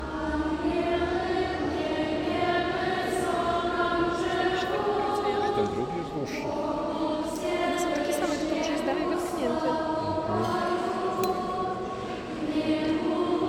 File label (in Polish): Zapryczastna piesn chor dzieciecy; chorow ze swieta parafialnego 2023